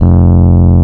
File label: F 0, Electric Pianos; Wizoo